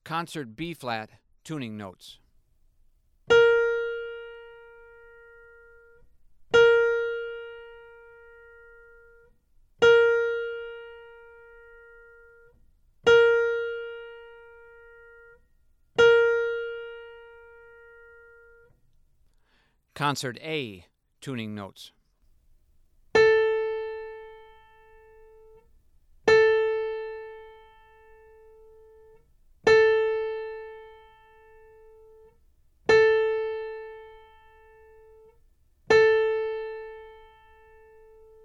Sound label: Tuning Notes; James „Jamey“ Aebersold Play-Alongs; Invitation – Play-Along With Organ & Drums